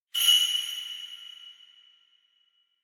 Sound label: Kagura Suzu